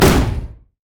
minotaur headbutt; LoL; alistar

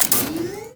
rumble overheat

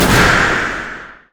reckless swing; olaf pentakill; LoL

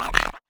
wolfman grunt; LoL; monsters

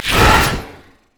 thorn plant; Zyra

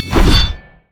crit attack; LoL; tryndamere

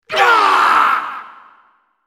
dying; Malzahar

